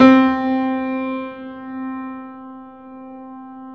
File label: PED FF, GRAND PED; Best Service Advanced Media Trax Modern Composer